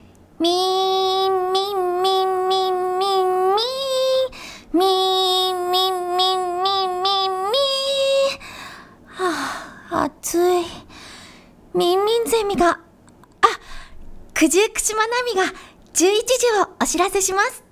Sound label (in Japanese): ミンミンゼミ